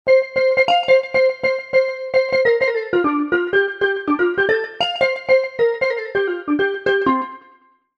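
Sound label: steel drum; TURBO VL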